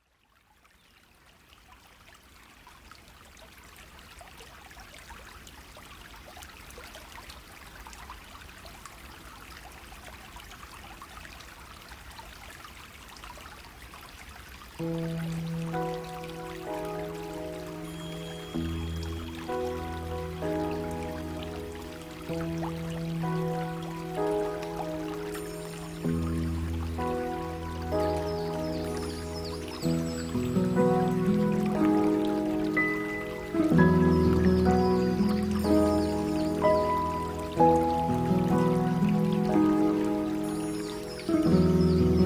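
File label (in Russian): звуки природы, течение воды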